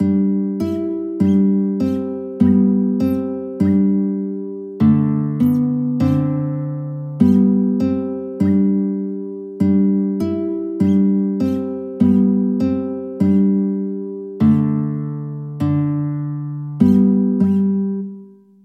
guitar

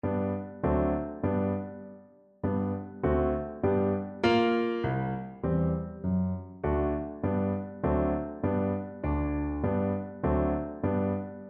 hot cross buns, Recorder

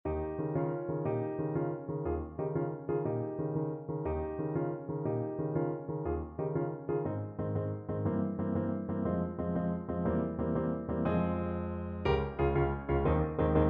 tenor saxophone

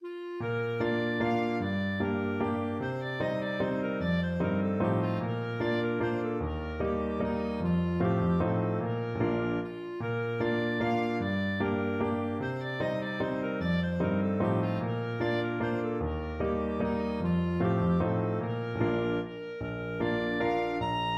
beauty in tears, clarinet

